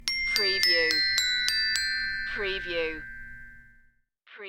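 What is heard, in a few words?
Xylophone Melody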